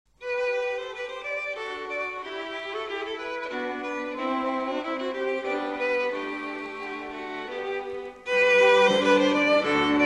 instrumental

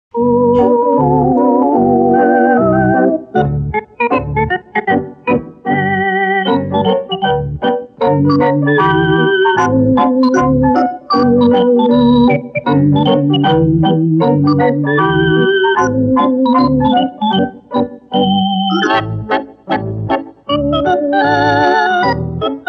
old southern schottische (instrumental)

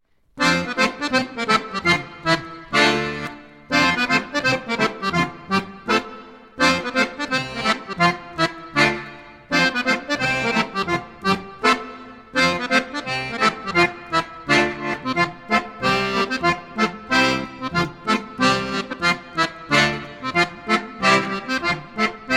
alunelul v.1 (instrumental)

